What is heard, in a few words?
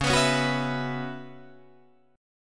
C sharp Minor 11th C sharp, Guitar Drop D